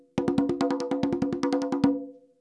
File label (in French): frise, congas